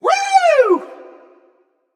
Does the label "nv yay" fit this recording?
Yes